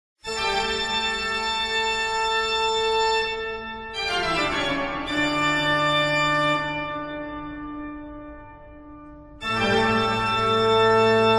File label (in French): Toccata en re pour orgue, Theme; Bach